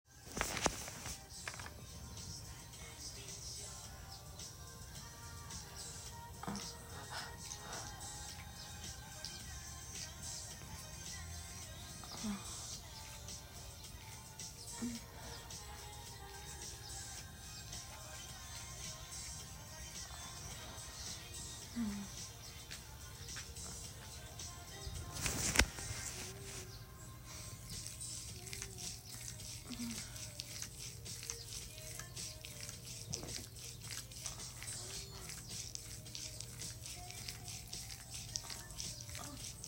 Whimpering, Wet noises; F4M; sleepy gorl; Spittin

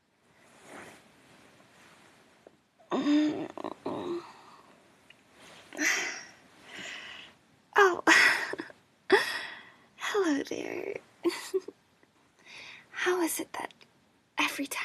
Waking up with you, wake up noises, kisses; F4A; skipping work; rambles; plans for the day

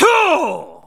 Grunt; Lewd SFX

